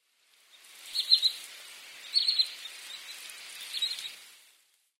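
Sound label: Asian short-toed lark, sakata; himekotenshi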